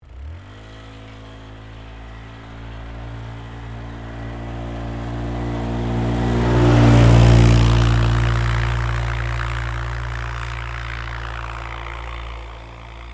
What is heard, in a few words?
ATV Pass By, Sports, Atv, Pass, By, Close, Medium, Speed, Polaris, 500Cc, Four, Wheeler; Atv Sound Effects; Blast Wave